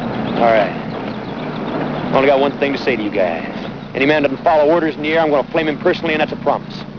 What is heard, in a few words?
flame